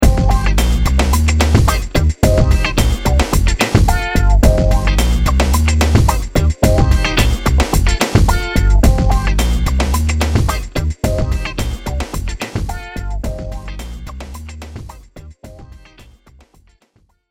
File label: rock